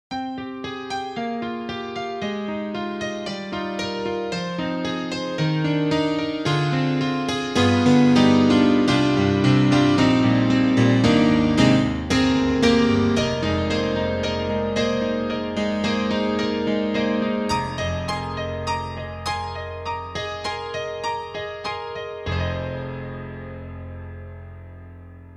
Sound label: YAMAHA EX5, Stereo Piano